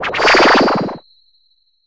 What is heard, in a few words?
Param down